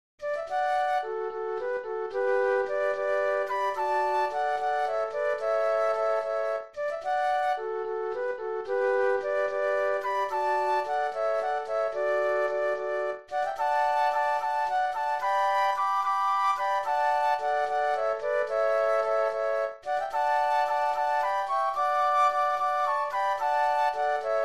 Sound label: Flute Trio